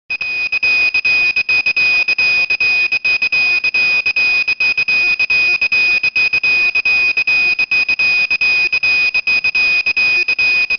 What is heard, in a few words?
Beepcode Out Of Range